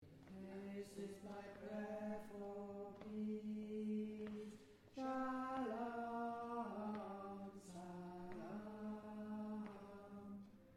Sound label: Tenor